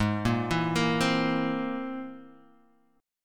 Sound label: C Augmented 7th G sharp